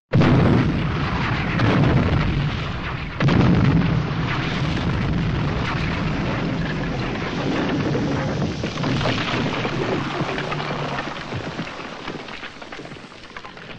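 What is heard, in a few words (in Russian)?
3 подряд и осып разрушенного строения; Звук взрыва от бомбы или снаряда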